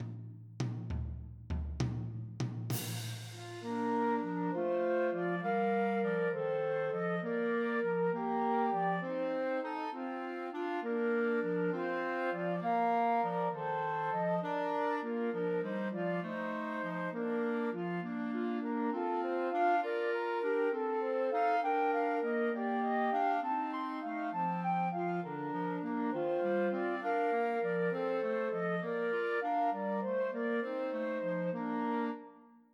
I Saw Three Ships Christmas Trios for Two Flutes and Clarinet